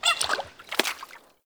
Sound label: Wetland Forest Day Frog; Ambience